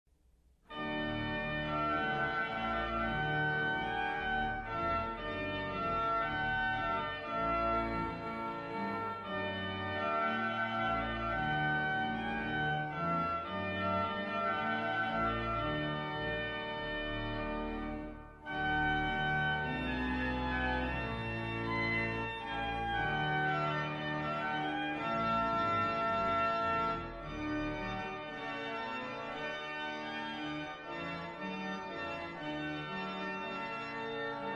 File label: organ track; Cardiff St John